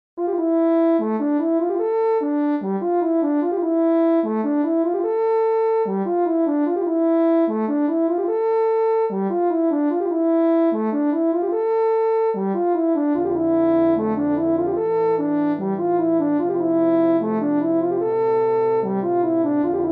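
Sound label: sea monsters brass french horn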